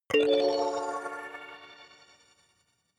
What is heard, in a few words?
notification kalimba magical fifth